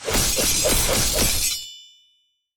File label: sword slash